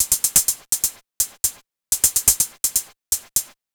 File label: drum loop under hat 125, Classic Chicago House; Sample Magic; Drum Loops